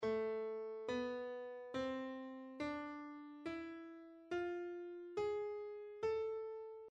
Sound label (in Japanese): Am 和声短音階